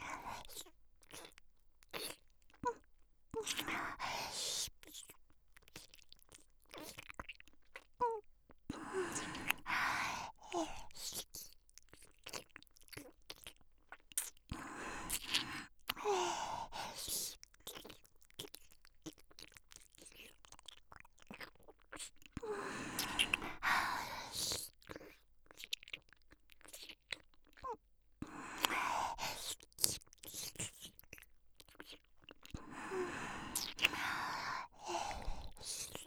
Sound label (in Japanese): フェラ音